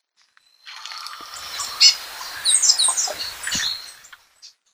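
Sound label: Yellow-bellied Tit; ishigaki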